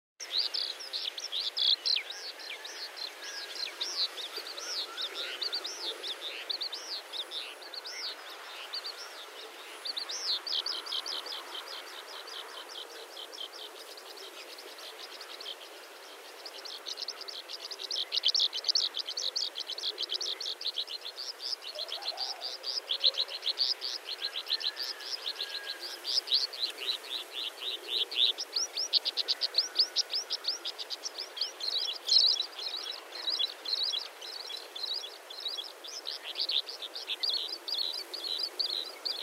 Nawadankulam, sri lanka nature sounds; Animals heard in the soundscapes

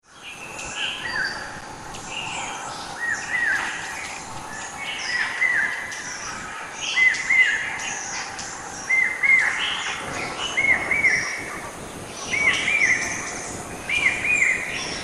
Common Hawk Cuckoo, Udahamulla; Sri Lanka

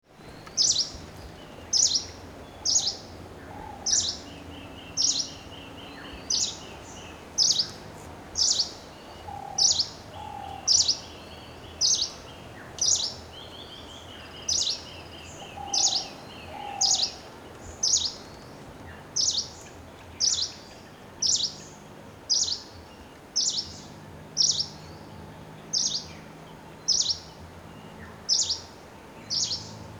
Green Warbler Tanamalwila

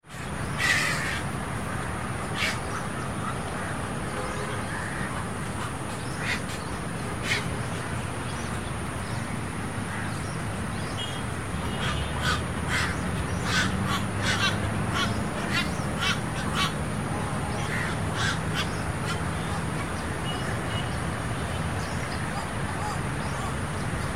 Heronry at Diyawanna Oya, sri lanka nature sounds

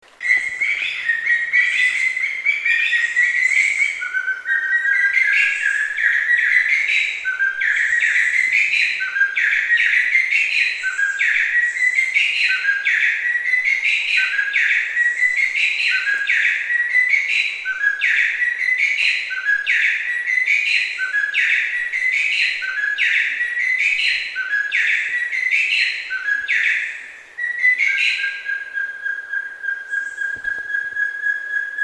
Sri Lanka Spurfowl duetting song

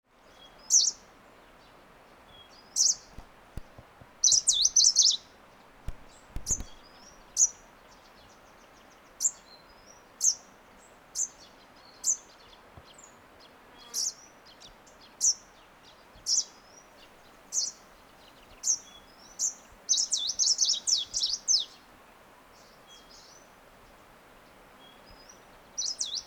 Green Warbler Horton Plains

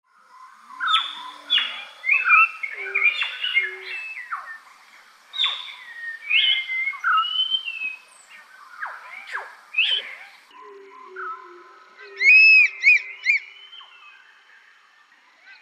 Birds of the rainforest

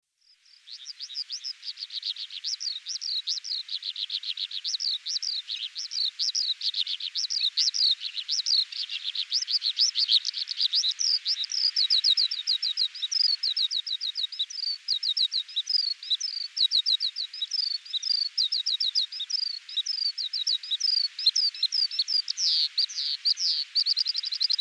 Oriental Skylark; Sri Lanka

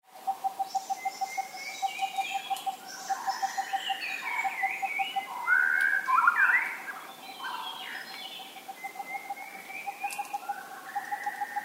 Sigiriya, sri lanka nature sounds; Animals heard in the soundscapes

